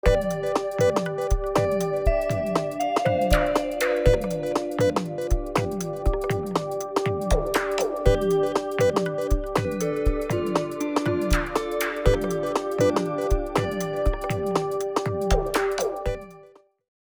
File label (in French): Musique urgence; deep dive repair game design